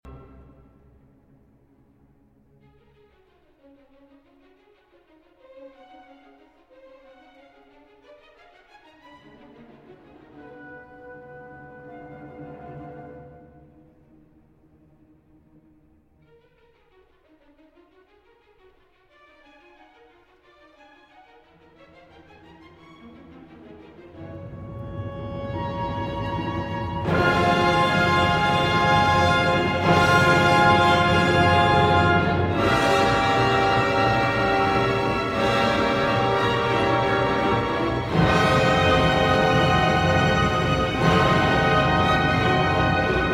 Symphony No. 6 in F Major, Op. 68 Pastoral IV. Thunderstorm. Allegro